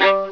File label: Fiddle G2; Instrmnt; Sound Library 2000; Wayzata Technology